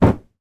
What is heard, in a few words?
dressing gown drop on floor; foley